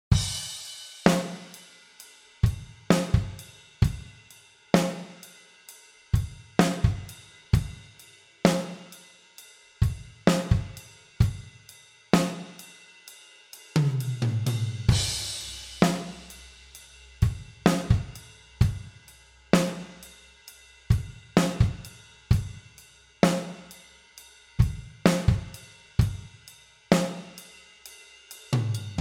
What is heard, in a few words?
ballad